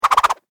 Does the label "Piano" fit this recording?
No